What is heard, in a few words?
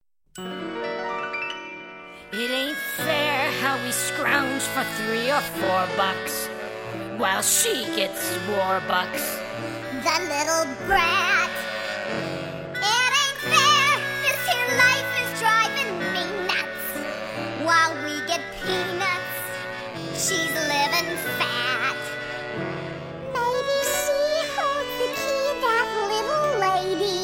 sing along; Annie; rehearsal materials